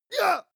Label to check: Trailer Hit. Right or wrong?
Wrong